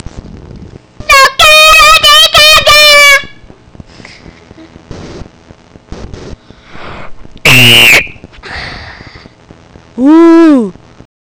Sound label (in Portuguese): grito